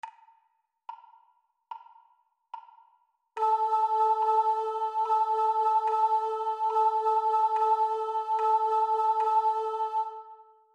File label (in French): Rythme Haut